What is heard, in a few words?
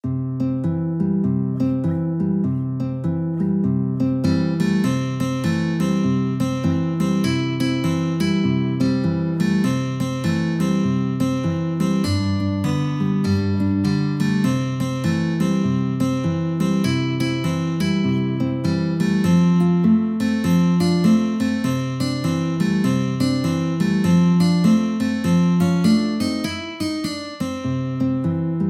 guitar duet